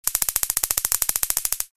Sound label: Taser buzz electrical clicking stun gun